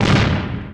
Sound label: Hit